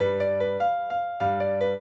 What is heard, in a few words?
trio, piano; Mozart